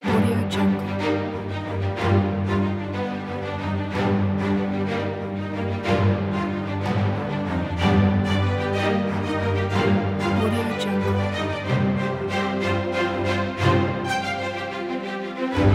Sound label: superhero theme